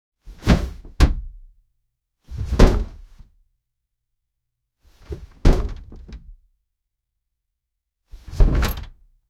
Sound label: energetic sfx for a studi, chair